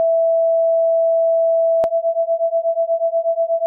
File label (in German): prim rein und unrein